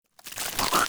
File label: maggot wrist swell; finalmother